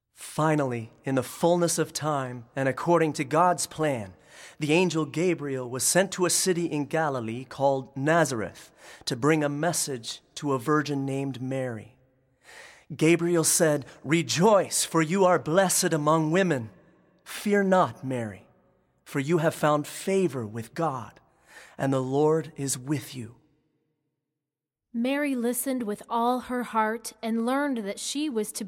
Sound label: satb